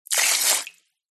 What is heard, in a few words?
human spit water out into metal bowl short small amount fast